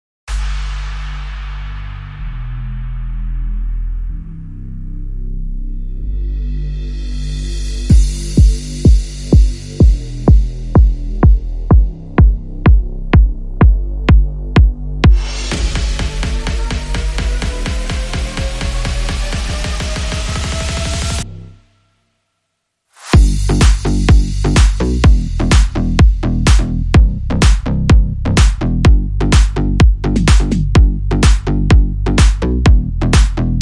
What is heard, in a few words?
Drums Bass and SFX Only